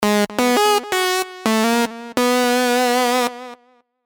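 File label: Sylenth1 lead; The Duel; CHIPTUNE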